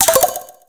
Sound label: gift Get